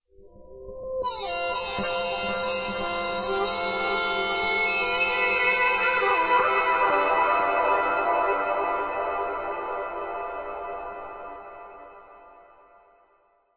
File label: guitar growing from the earth